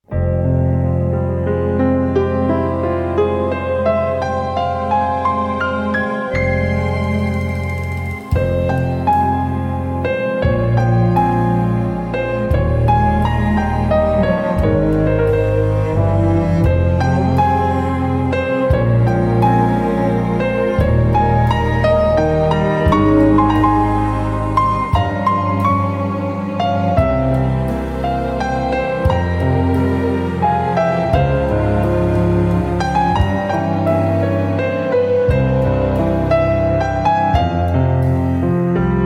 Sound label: Waltz